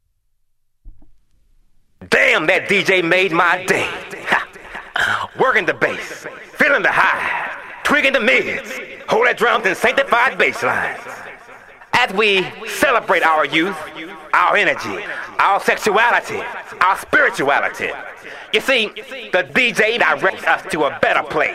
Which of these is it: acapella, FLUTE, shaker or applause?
acapella